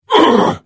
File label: Hit; The Elder Scrolls III - Morrowind